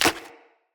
Agaric Mushroom Grow; Resource Materials

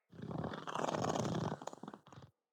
Pet Purr; Enemies; Catbrow; Pet Ship

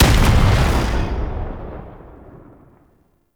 Gatling Gun End Stereo; Warframe; Tau Gatling Gun

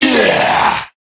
Death, Male

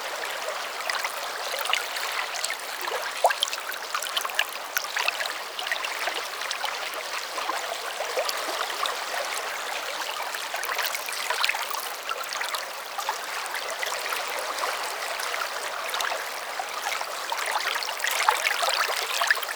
Exterior Combat Water Lake Small Loop; Duviri

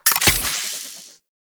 Shotgun Glaive Return Mech; Warframe; Tenno; Alchemist

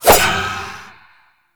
Void Dagger Whoosh, melee weapons; Warframe; Zariman Dagger; Void Mode

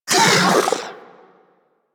Mini Moa Vocal Death, Corpus; Warframe

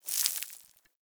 Settlement Sand Fine Debris; Warframe; Grineer Settlement

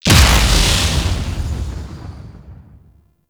Sentient Vessel Step, Enemies; Warframe; Tau; Movement

